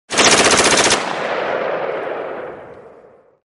tembakan gun sound

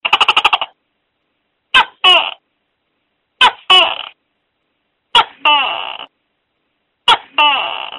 tokek

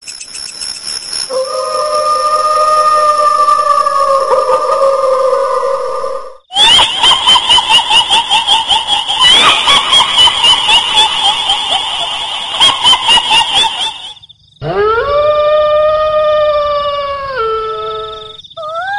suara kuntilanak tertawa menangis disertai lolongan anjing

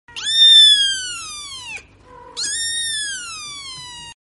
suara kucing cat voice

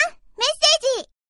nada pesan message nada dering message

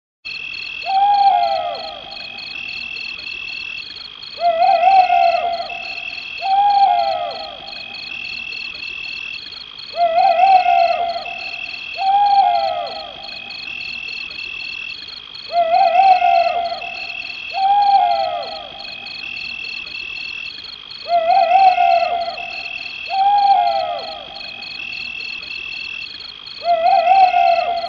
burung hantu owl sound

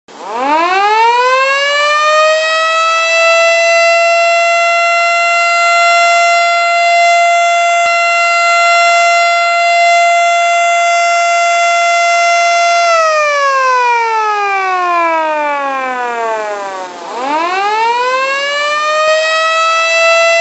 suara buka puasa sirine berbuka horn sound

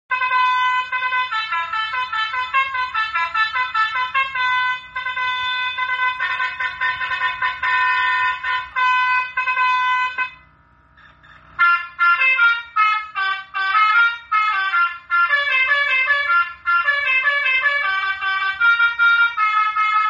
klakson bus basuri klakson telolet elektrik; nada basuri

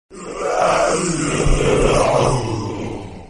orang lucu notification alert

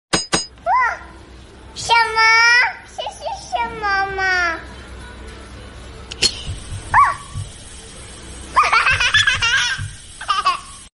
nada wa lucu kartun

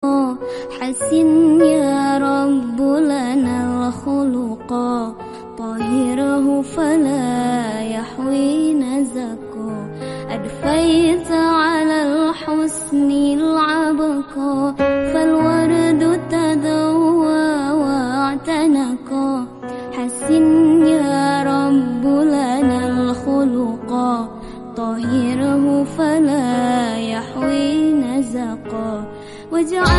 sholawat sedih sholawat merdu, Islamic

